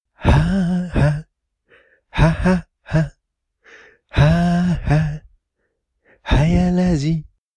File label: hahaha